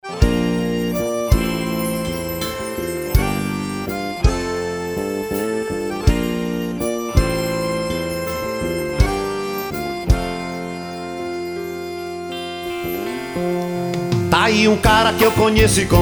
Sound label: Jingle